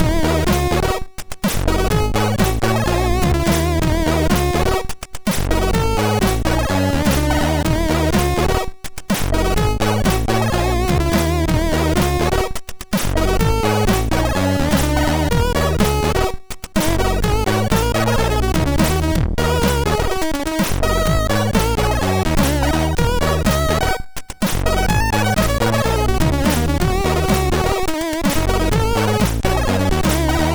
Funky Tune, MOS6581R2

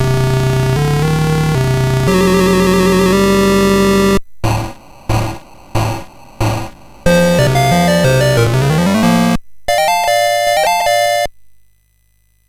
Musicbox, sid MOS6581R4

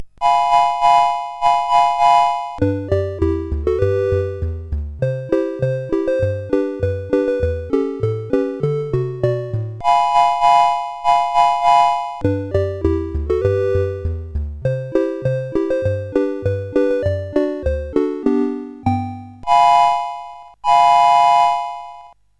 Season Jingles, CSG 8580

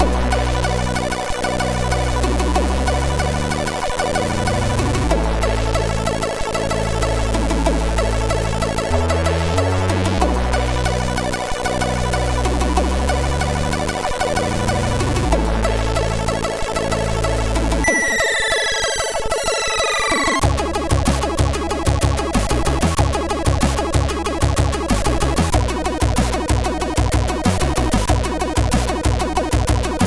Hip Hop, sid MOS6581R3

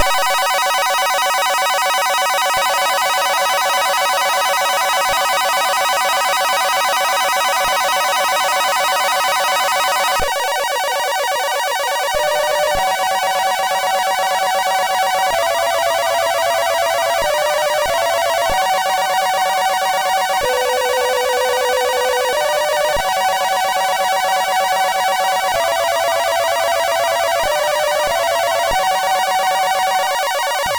Scream Wing sid MOS6581R3

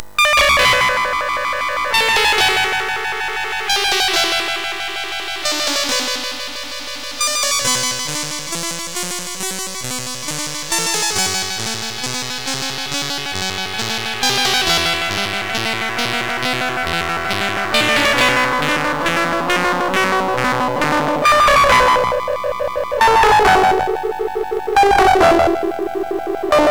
Hocus Focus, MOS6581R4

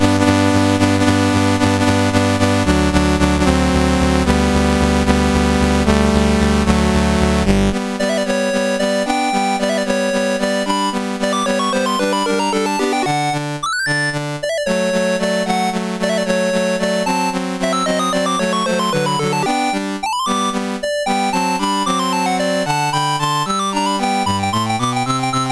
Grand Waltz, CSG8580R5

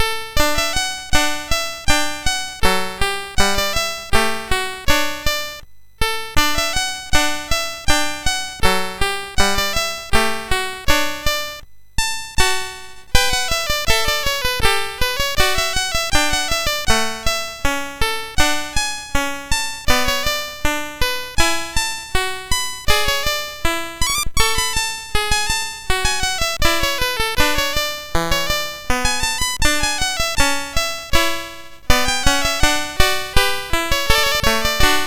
SX 64 Demo sid MOS6581R2; Commodore

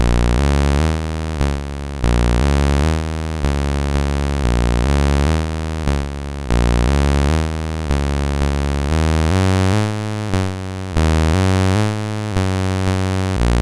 Jazz Bass Line, MOS6581R2

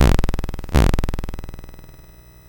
BASIC sid MOS6581R4, GAMES; Sword of Fargoal

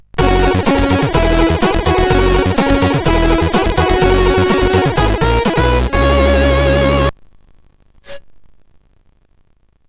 Hydrogenese loader sid CSG8580R5

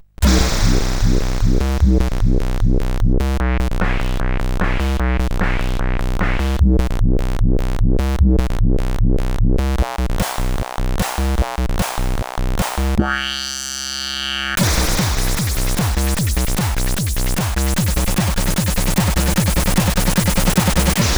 303 plus 808 plus 303, CSG8580R5

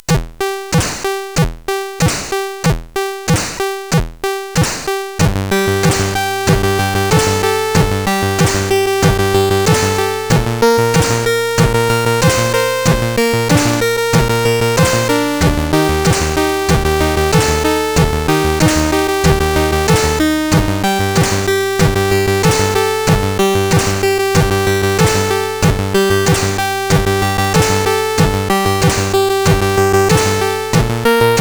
Reggae, CSG8580R5; sid

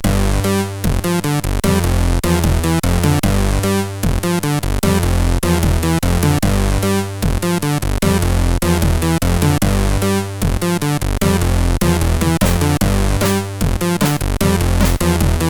Rock, sid CSG8580R5

